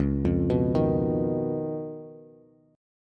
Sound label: Db 9th Db down Bass; JGuitar